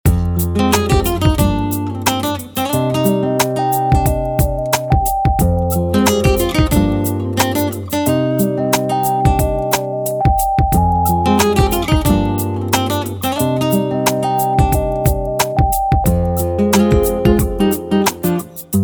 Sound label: instrumental